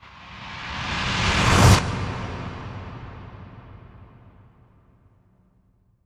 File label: impact rush; M-Audio Pro Sessions; Liquid Cinema